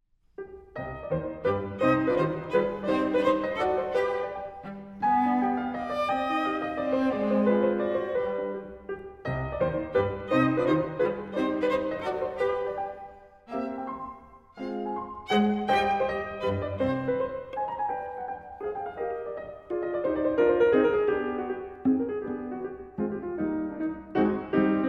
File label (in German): Klavier Trio